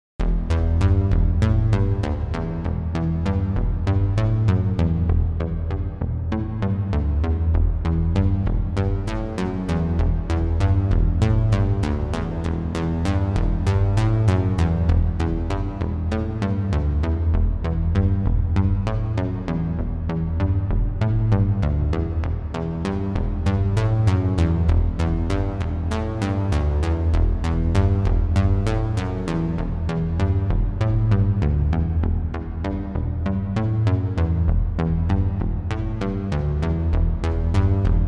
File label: Squish Drive Steel; Kush Goldplate